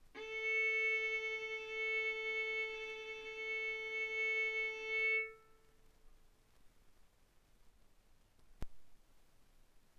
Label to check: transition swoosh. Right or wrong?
Wrong